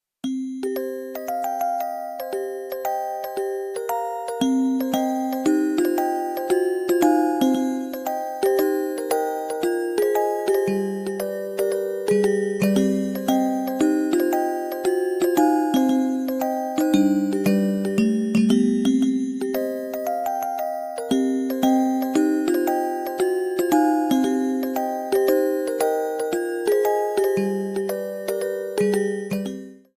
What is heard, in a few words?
ringtone